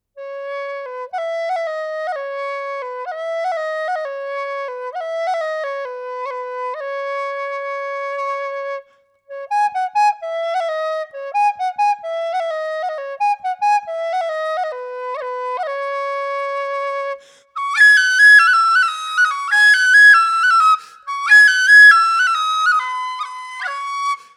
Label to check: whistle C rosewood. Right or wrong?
Right